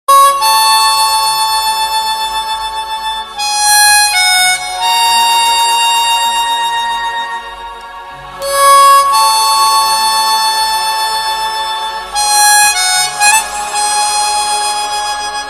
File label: Mouth Organ